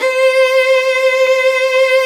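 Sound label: violin vibrato, Orchestral; Sound & Vision; Gigapack I CD 2; Violins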